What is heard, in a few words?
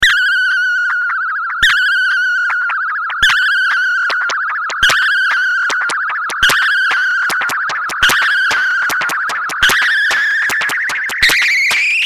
acid FM perc